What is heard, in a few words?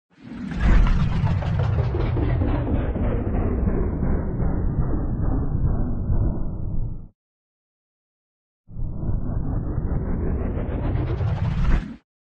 Timelapse; گذر زمان